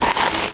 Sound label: Sand footstep; starbites